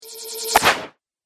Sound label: Archery Magic Shot, Bow And Arrow Sound Effects